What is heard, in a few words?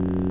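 lightsaber loop; MOTS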